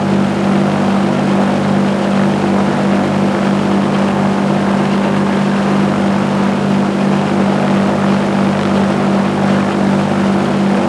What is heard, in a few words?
plane; World of Tanks